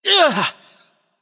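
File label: nonverbal ouch; smite; voicepack; merlin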